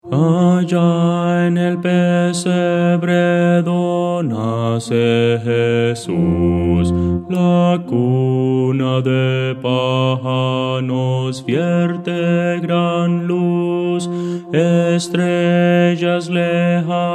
bajo, himnos cristianos

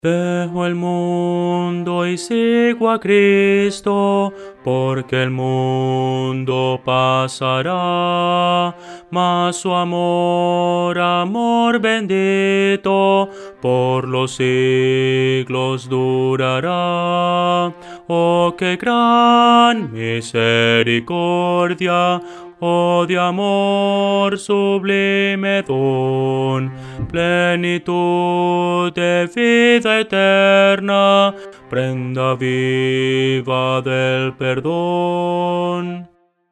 bajo, himnos cristianos

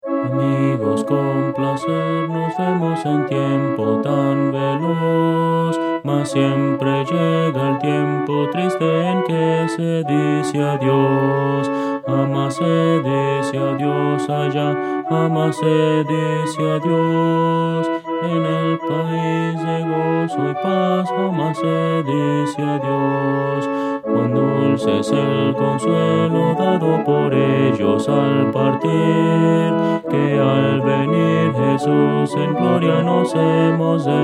contralto, himnos cristianos

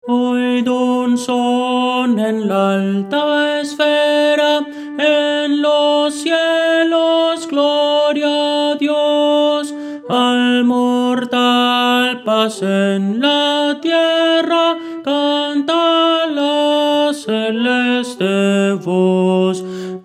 oid un son en la alta esfera tenor

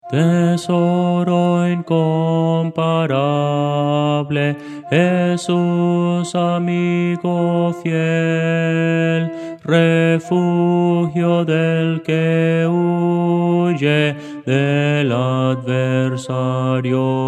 contralto; tesoro incomparable

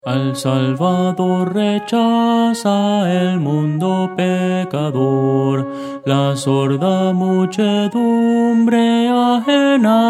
melodia

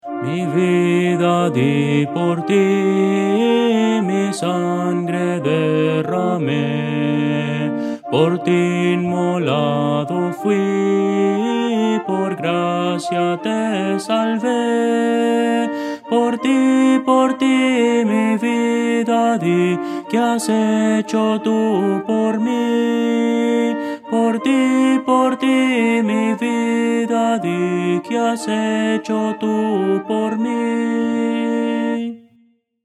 melodia, himnos cristianos

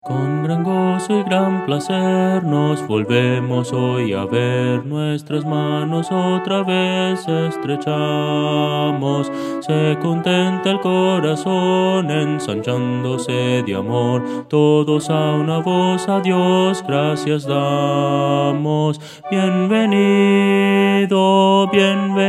contralto; Voces para coro

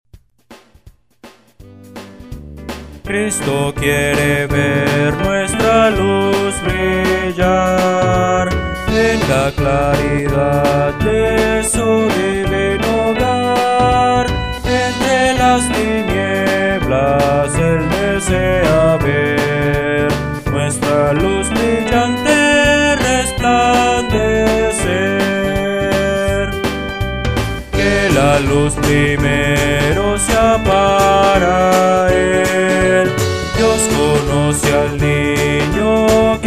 Cristo quiere ver nuestra luz brillar, Himnos Cristianos

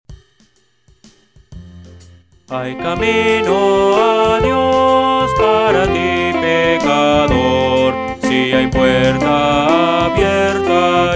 Hay camino a Dios, Himnos Cristianos

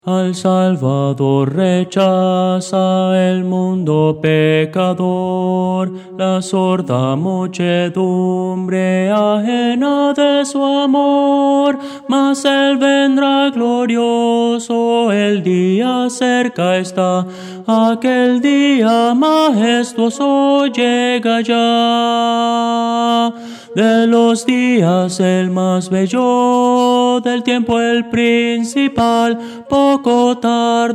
tenor

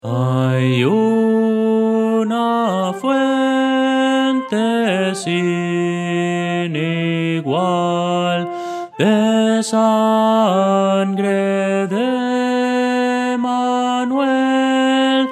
melodia, himnos cristianos; hay una fuente sin igual

